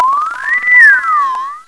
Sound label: SPACEY; HIGH TECH